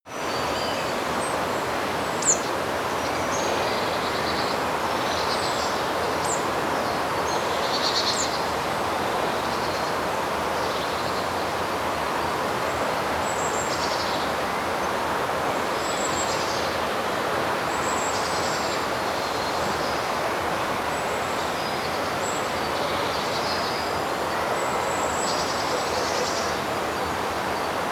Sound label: forest birds distant